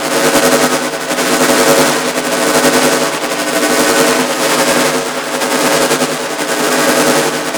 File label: Egg Mine Loop; Tau